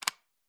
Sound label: household plug socket 4 way extension extract electric